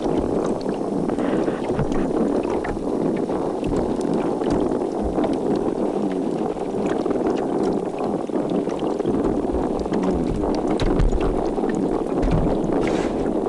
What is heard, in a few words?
Spotted seatrout chorus, fish sounds